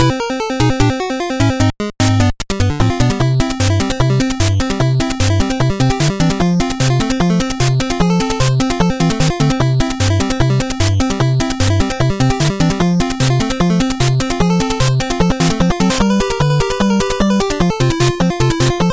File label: Boo Race, BGM; Super Mario Bros. Remastered